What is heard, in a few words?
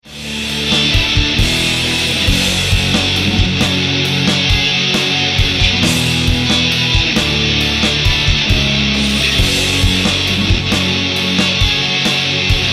Rock Tracks